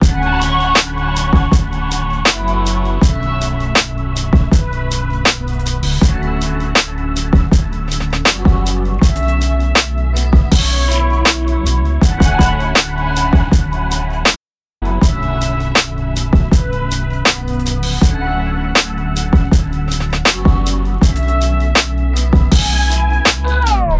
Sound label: RnB Night